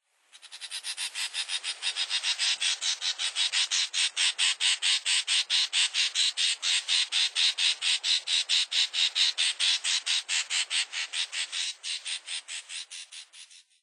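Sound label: Oriental Reed Warbler; chikuma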